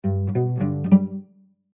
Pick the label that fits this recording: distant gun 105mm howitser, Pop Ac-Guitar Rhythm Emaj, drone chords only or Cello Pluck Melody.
Cello Pluck Melody